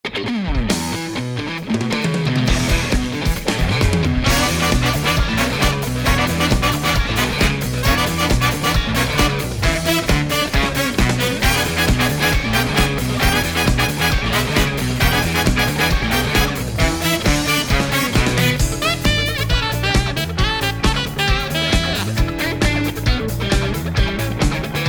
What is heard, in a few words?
Rock Arrangement; Waluigi Pinball